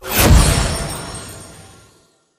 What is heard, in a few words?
Full Power Shoot; Aladdin; Tales of Arabia pantheon